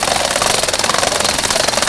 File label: bike